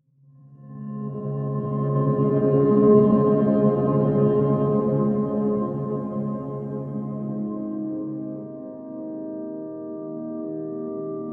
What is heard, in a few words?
Shimmery Guitar Atmosphere